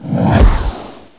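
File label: fireball; duke nukem total meltdown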